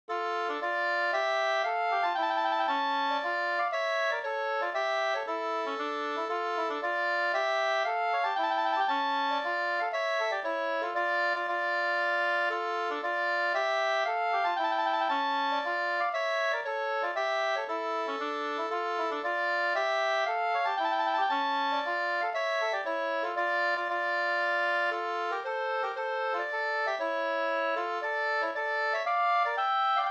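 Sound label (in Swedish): polska, tvåstämmig; Kalmar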